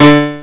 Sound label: piano